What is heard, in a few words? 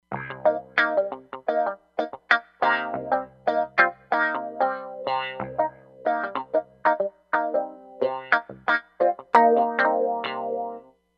Cut Thru Wah